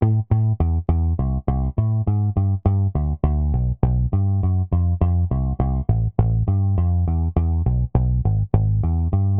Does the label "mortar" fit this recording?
No